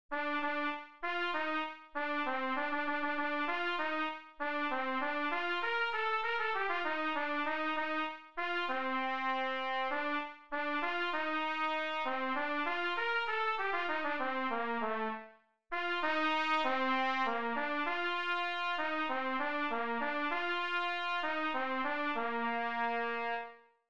MS Trumpet C fast, etudes; TRUMPET Technical; Region 23 Middle School Etudes for 2026-27 (Year C)

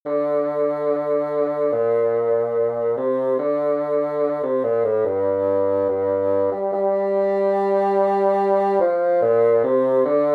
Bassoon slow, etudes; BASSOON Lyrical; Region 23 Middle School Etudes for 2026-27 (Year C)

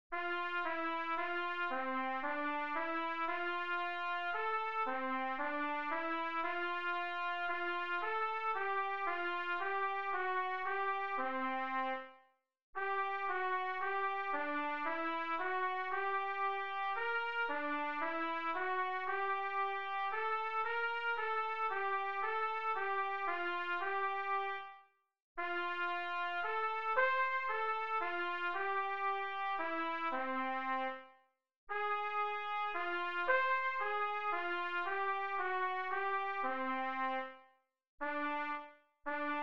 MS Trumpet C slow, etudes; TRUMPET Lyrical; Region 23 Middle School Etudes for 2026-27 (Year C)

